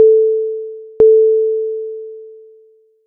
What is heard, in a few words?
Alert Tone